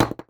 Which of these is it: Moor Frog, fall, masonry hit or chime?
masonry hit